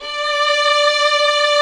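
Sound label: strings, 8pc; String Master Series; Vlns 2-5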